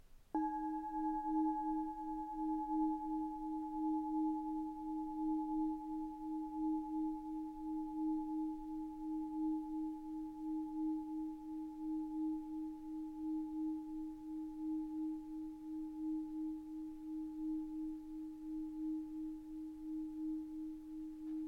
Cloche tubulaire 25mm D4

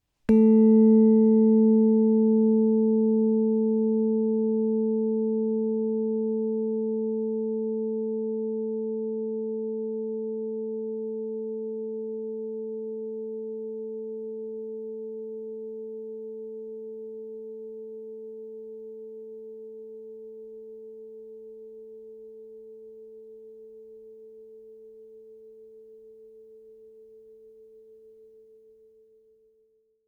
plaques harmoniques A3

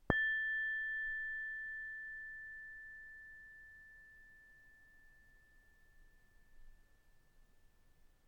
Cloche plate A6

